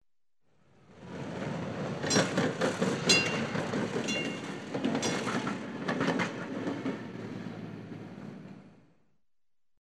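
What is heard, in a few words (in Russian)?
трамвай старый проезжает со звонком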